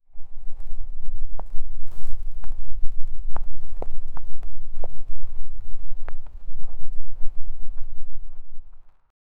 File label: monster